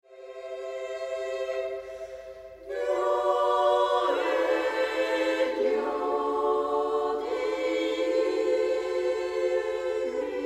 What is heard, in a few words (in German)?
Jodler